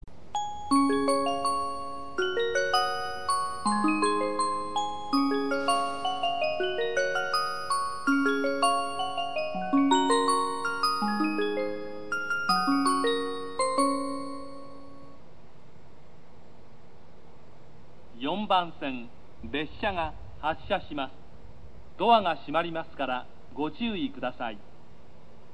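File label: kaihin makuhari, eki; kaihim makuhari 4; higashinihon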